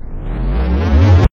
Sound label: Telekinesis